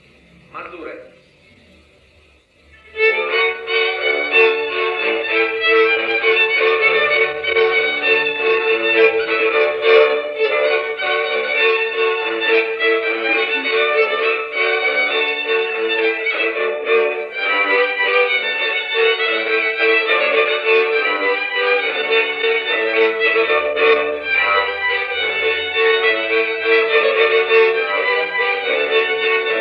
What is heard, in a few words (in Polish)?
instrumentalny dygacz; mazurek